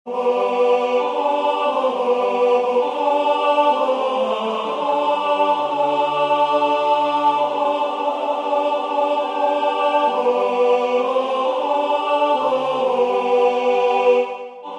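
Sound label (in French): tenor, Musique sacrée; Cantate Domino; Haendel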